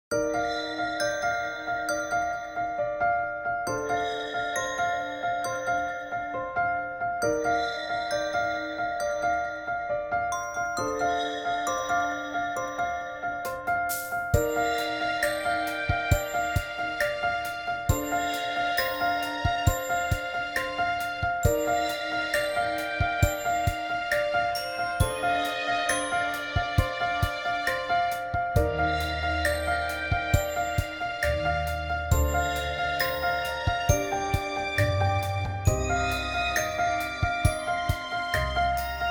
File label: ice cave